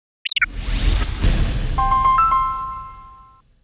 holiday Power On; LG; E739-myTouch

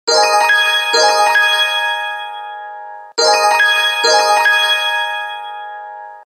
Charming Intro tone; Samsung GT-S5233 Star

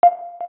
KEY XYLOPHONE 9, keytone; Samsung SGH-T369 Linx

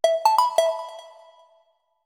Robot; Xiaomi; Mi-CC9

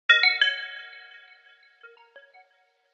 Alert Tri; HTC HD2

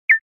Chat alert, phonetones Nokia N8

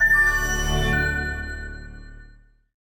Power On, LG V30 Plus ui

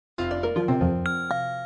Power Off, phonetones system; Huawei U6020

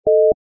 Network Congestion; Motorola M1000

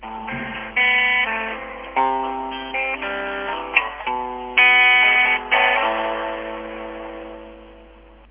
ballade; Alcatel; OT-C55x-PC-Suite; Content Library

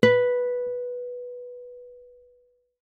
dial tone guitar; OnePlus One China

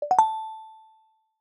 notification simple; Alerts and Notifications; Google; Material Design sounds

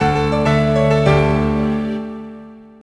Morning Piano; Sony; CLIE PEG TG50; Natural

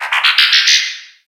Transform, notifications; ZTE Max XL N9560

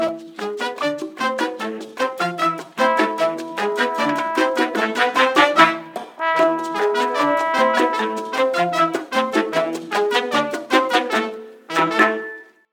Rumba, ringtones; Lenovo; A806

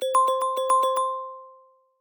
Timer, notifications; Vivo; X200 Pro

